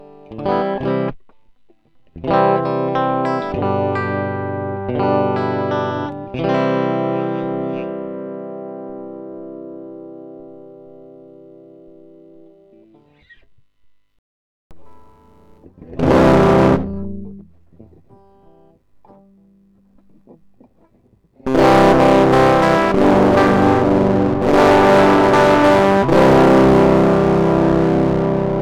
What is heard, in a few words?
fuzz